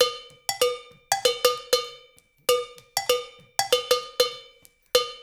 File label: LOOP, Percussion